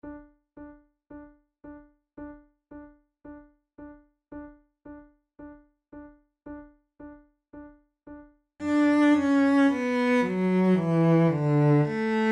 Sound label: trio sonata gavotta, flute tunes; corelli